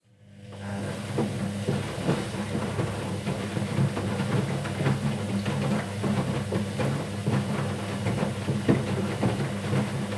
Washer

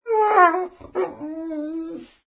ANIMAL DOG

